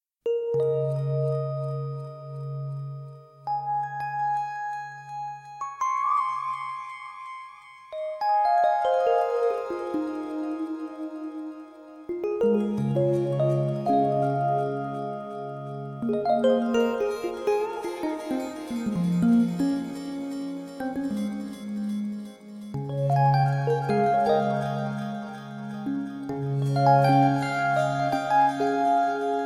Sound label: Gulls